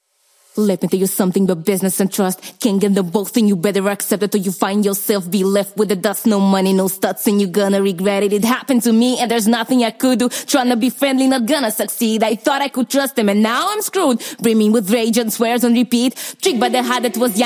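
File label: hip hop F minor 61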